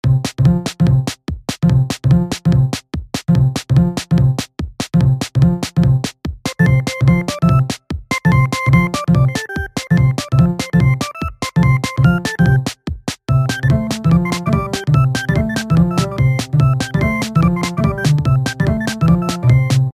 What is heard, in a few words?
Gameplay; Speed 7; Dumb Ways to Die 2 The Games; Android